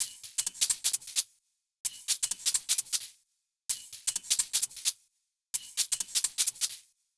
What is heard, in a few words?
Shake Loop 130; Equipped Music; Loungin House; Hh-Shake Loops 130